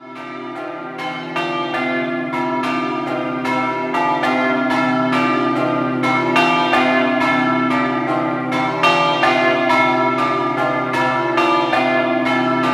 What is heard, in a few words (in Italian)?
Vigonovo, campane